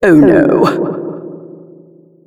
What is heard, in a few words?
end crash; Administratorin; monkeynaut